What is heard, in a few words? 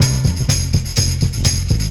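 MINERAL LOOP, Techno Trance; E-MU Formula 4000 Series Vol. 2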